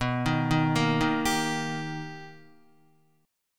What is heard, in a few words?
B Augmented Major 7th B Guitar, chord; B+M7; arpeggiated; JGuitar